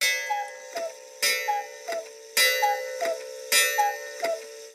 cuckoo clock ringtone

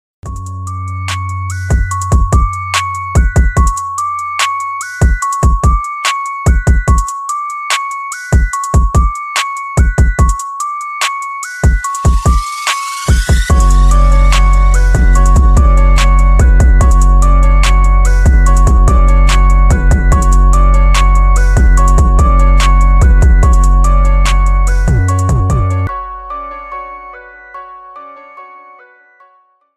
instrumental ringtone

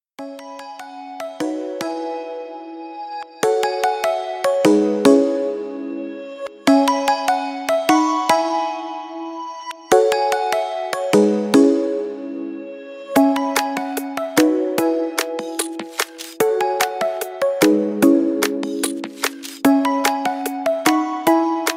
instrumental ringtone

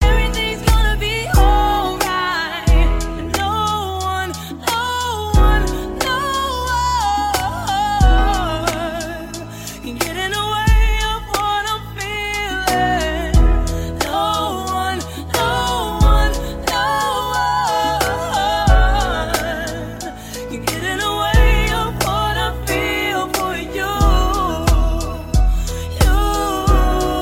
ringtone

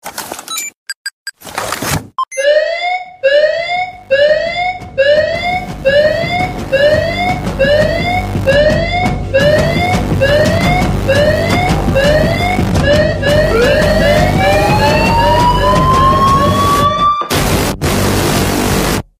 alarm ringtone; battlefield 3

